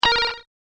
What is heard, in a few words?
Drop The Laser, laser sound; science fiction sound effect